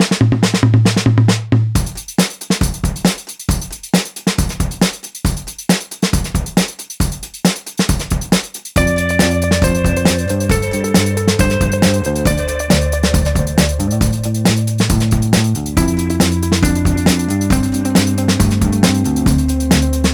dry folks

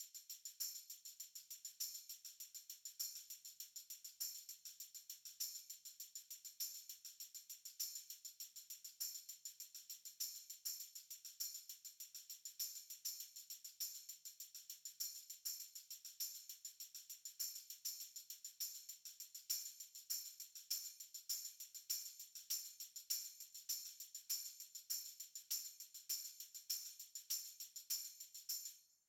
tambourine

